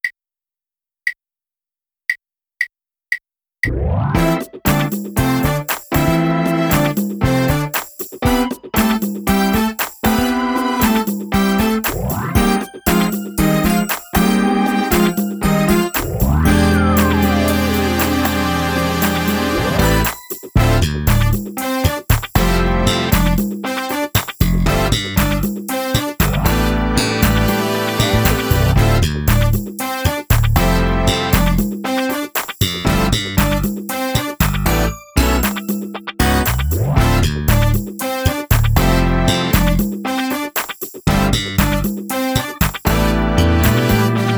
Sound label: Laxed drumless